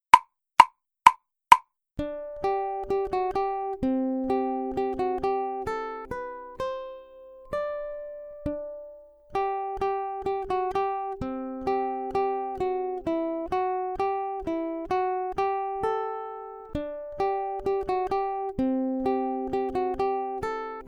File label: Accompaniment, ukulele; grade6